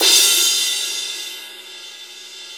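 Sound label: CYM CRA, cymbal crash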